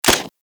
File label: Redux Fire Mech, Weapons; Lato Vandal